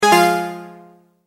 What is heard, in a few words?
multimedia tone error